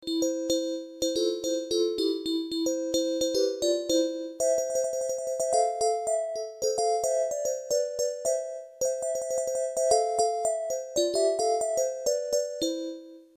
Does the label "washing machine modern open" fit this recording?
No